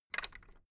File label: fence gate latch rattle; objekte; tueren